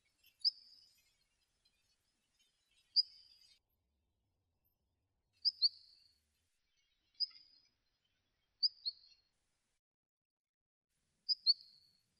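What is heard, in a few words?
benimashiko call, long-tailed rosefinch; jinaki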